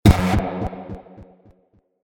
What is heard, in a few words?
Enemy Death